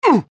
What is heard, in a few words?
Pain male